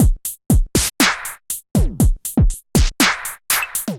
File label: Moscow UHF Kit, Drums, Hybrid; Ableton; Factory Packs; Drum Essentials